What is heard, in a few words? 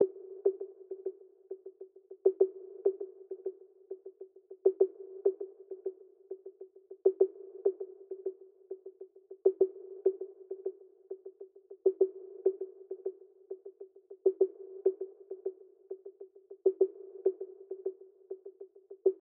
Percussion